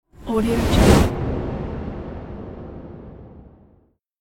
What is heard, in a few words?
powerful whoosh noise riser movement